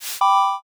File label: world move